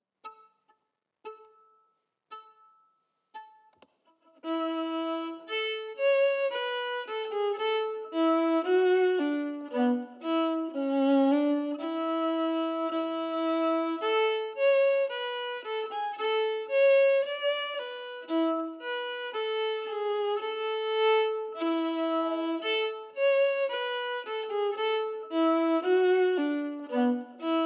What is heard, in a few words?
Warm In Winter slow